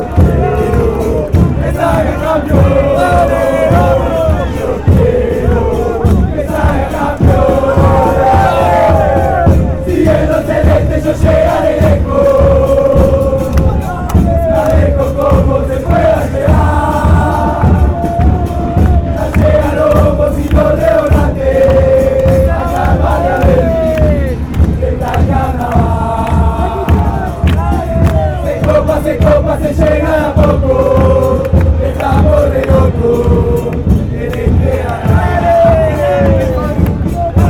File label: drums are arriving, fanchants